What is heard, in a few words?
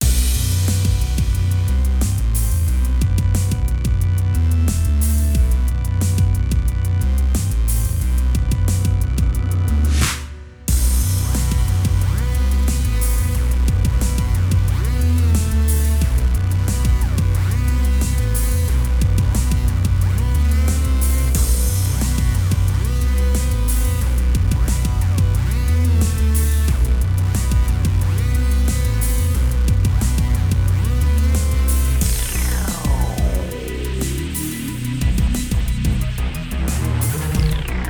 Boss repeat; FL337 Fleet